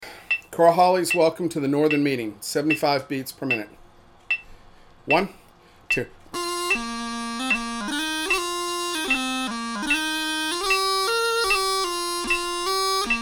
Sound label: 75bpm, bagpiper; Corriechoillie's Welcome